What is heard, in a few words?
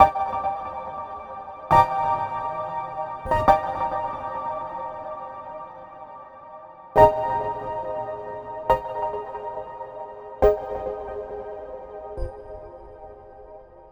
Bpm138 Clear Chord Loop CmG#maj, Dubstep; Prime Loops; Dubstep Illusions; Airy Loops